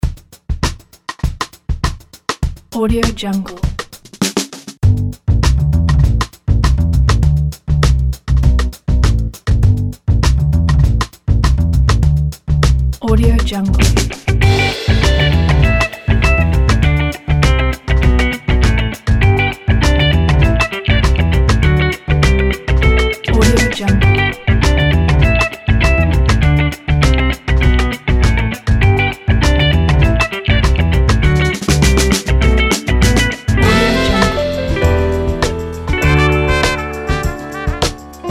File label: jazz